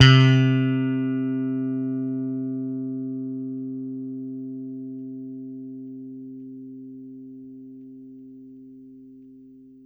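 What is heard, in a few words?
Ricken finger bass C3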